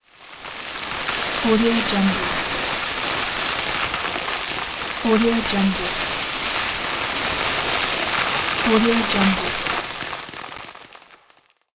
falling sand; marburymedia

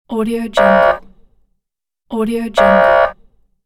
censored sound; alexsandal

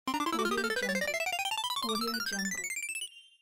arcade scoring

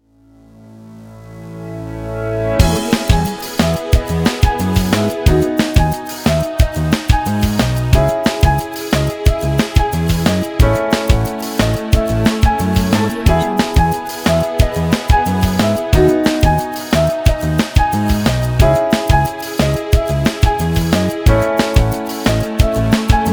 spring inspiration music

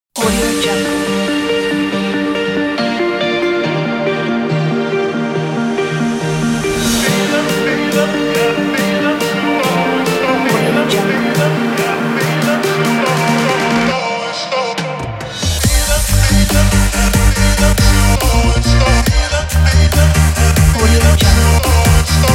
future bass pack

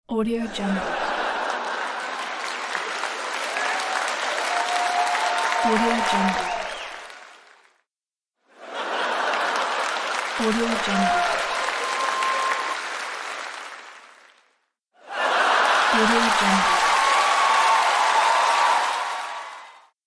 crowd laughs and applauding

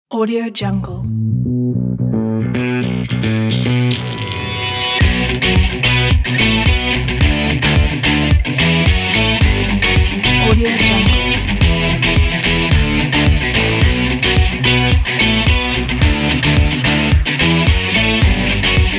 adrenaline rush

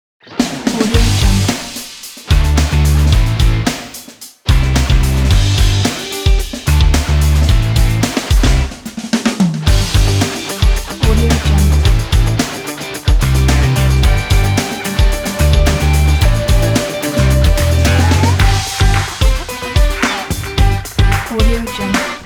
pop rock freedom

